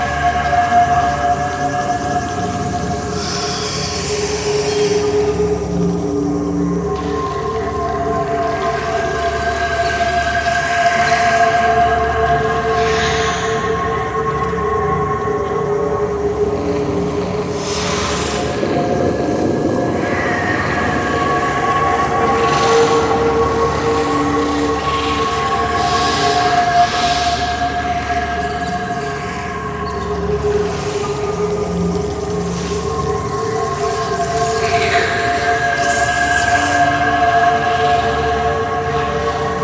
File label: spider ambience loop